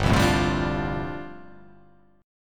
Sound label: G 7th Suspended 2nd Suspended 4th